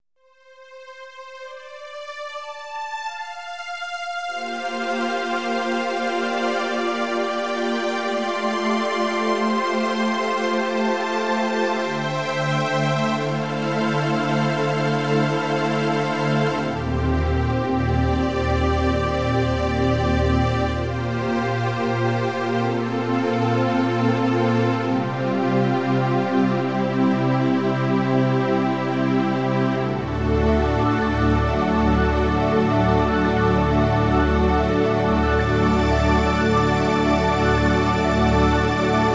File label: ringtone